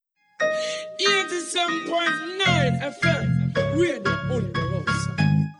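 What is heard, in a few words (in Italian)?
spot reggae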